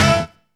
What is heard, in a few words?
HIT, HITS+STABS; Zero-G